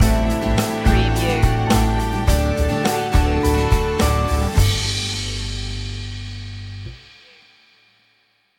feelgood morning stinger

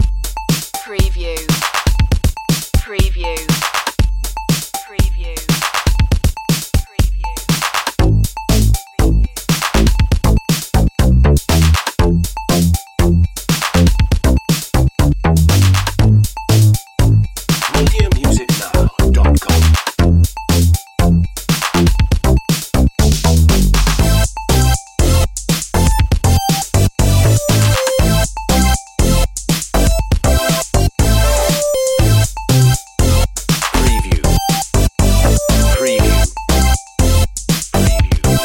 video game fun

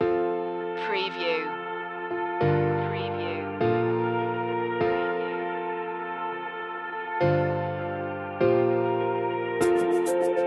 corporate bgm

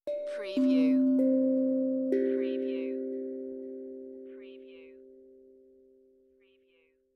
Tongue Drum Meditative Tone; beanstalk audio

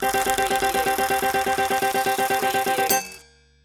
Casino Slot Machine Winning Count

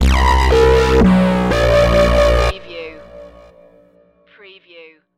Short n Evil

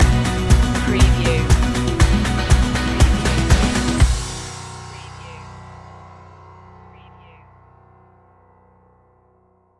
together we go stinger

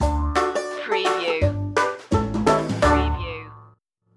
party barn banjo stinger

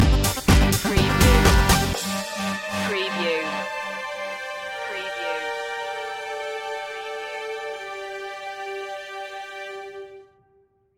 power agent stinger

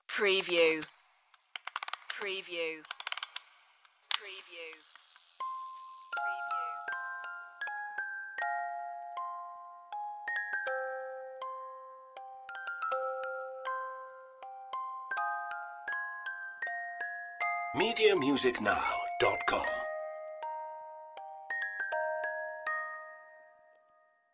music box machine

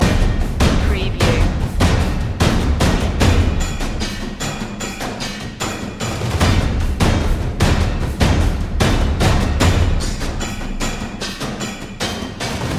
toms of tension loop

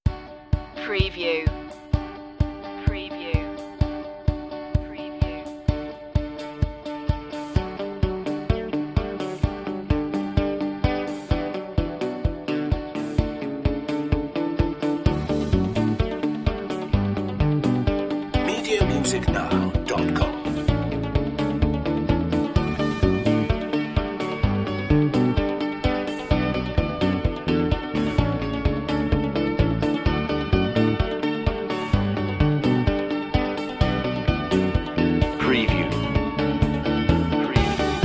corporate ventures

